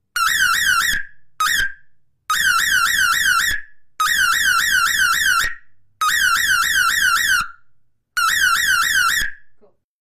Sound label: Alarm Synthetic; hi-tech-various